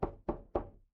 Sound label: door knock